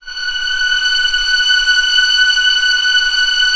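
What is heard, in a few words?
strings F#5 PP L; Sound On Sound - Peter Siedleczek's Orchestra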